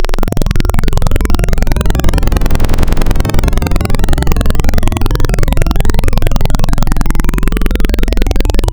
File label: Spectral Gate Log, wavetables; Architecture 32